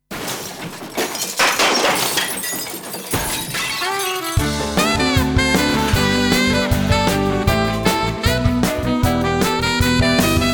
Orchestral transition

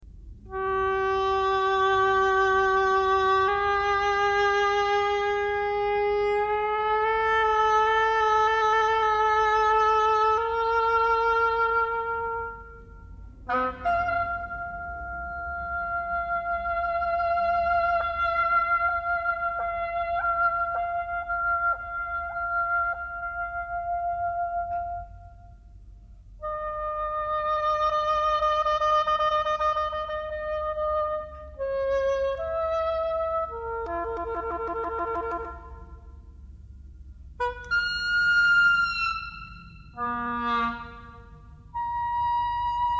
Oboe solo, oboe, clarinet and bassoon

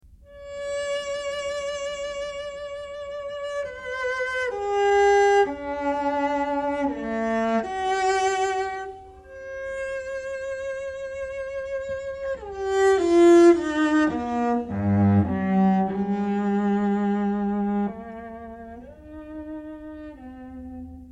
Lento, molto expressivo, Cello Solo

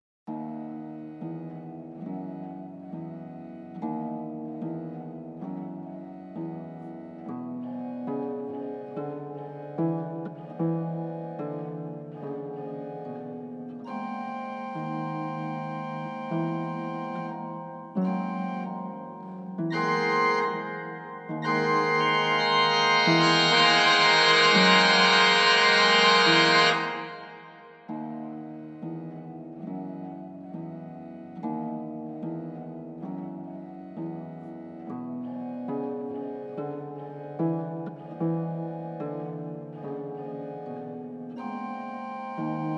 Garbage van, organ and harp

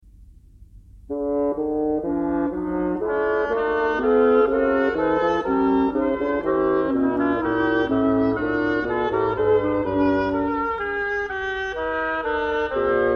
Fuga a la Chorale, oboe, clarinet and bassoon

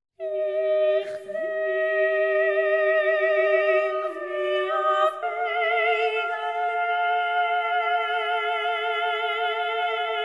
Electro-Acoustic Cycle

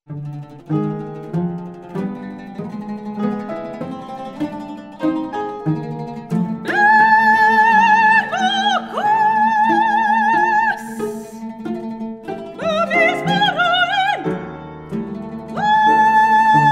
soprano, oud, cello and piano

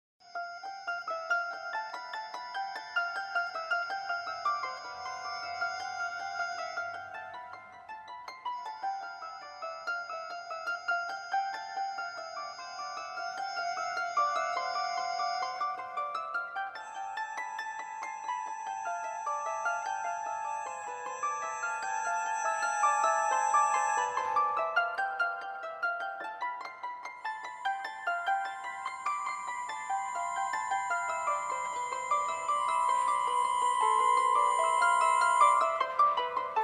Cadillac- Mercedes, organ and harp

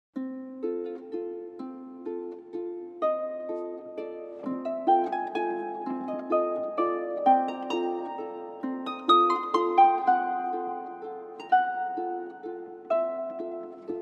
organ and harp

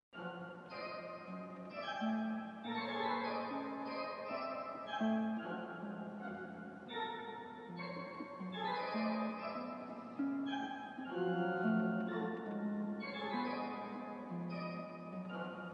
Jeep, organ and harp

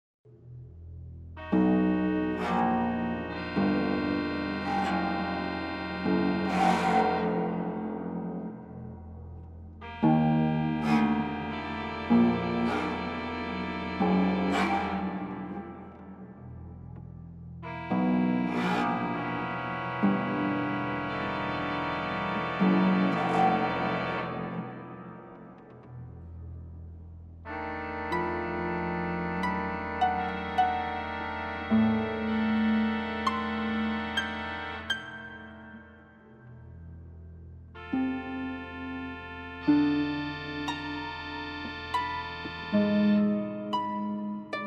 organ and harp

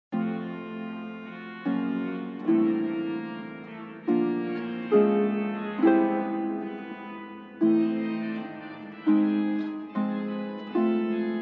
organ and harp

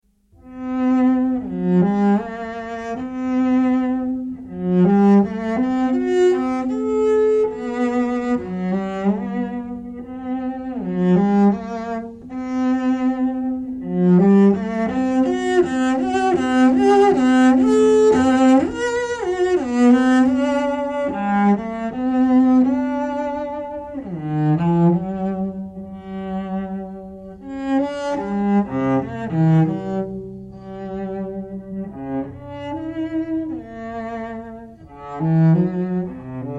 Moderato, Cello Solo

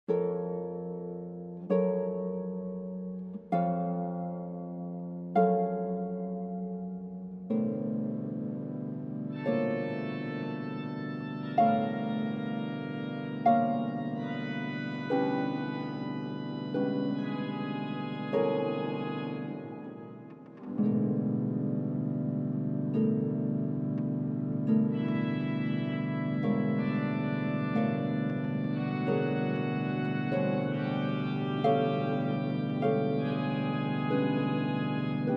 organ and harp

